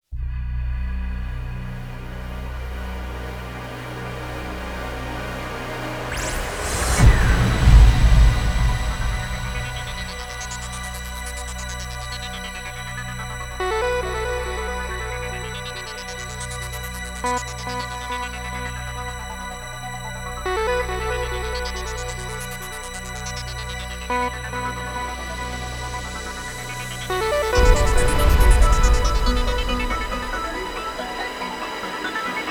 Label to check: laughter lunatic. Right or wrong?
Wrong